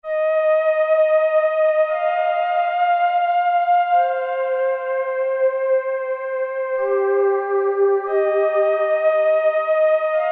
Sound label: Cosmic Vox